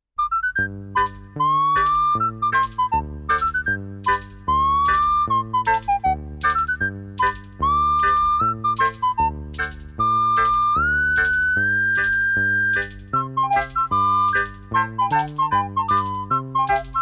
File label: whistling robot